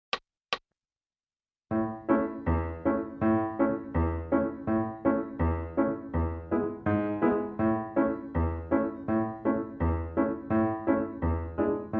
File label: Piano; Oh! Susanna